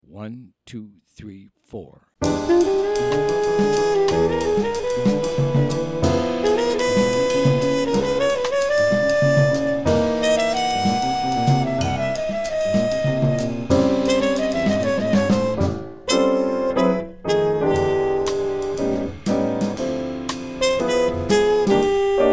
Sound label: instrumental minus bass